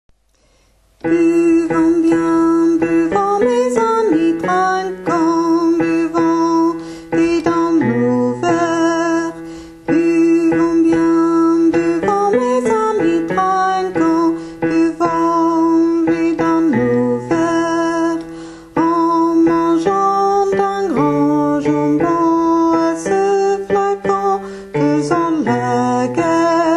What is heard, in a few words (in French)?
basse; tourdion